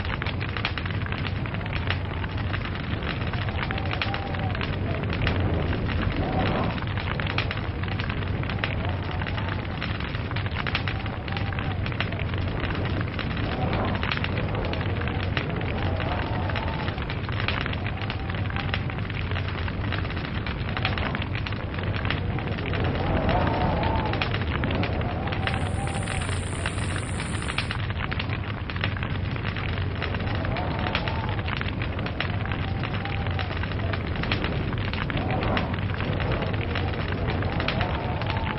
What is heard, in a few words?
Log Cabin Fire Wind